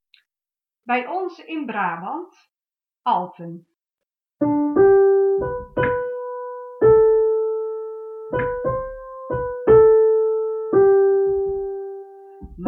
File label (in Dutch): Bij ons in Brabant alten